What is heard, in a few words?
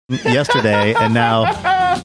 funny laugh